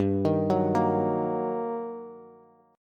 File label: G Minor Major 7th Sharp 5th, G, down, Bass; GmM7#5 arpeggiated; JGuitar